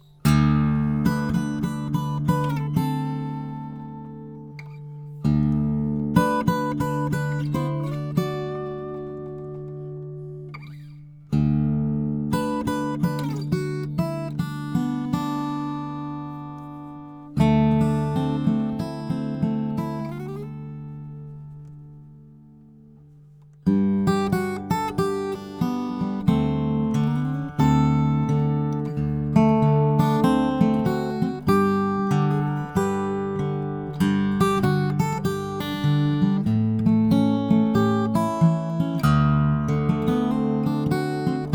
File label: Music RME